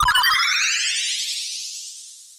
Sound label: UI Appear